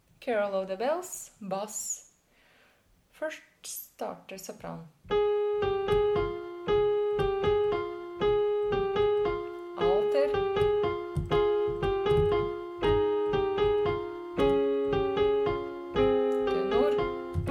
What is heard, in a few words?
bass; Carol of the Bells